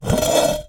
Drag, Vase

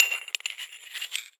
Hole Saw Scrape; Hole Saw Bits

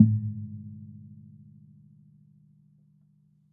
Classical Guitar Marimba Chunks

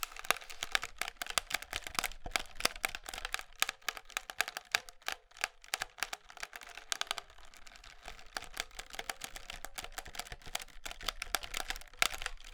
Talking Drum Cords; Percussion Samples

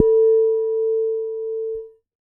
Soft Hit, Large Wine Glass

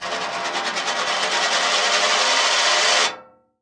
Coin On Metal Tray

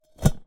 Short, vase

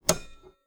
Typewriter

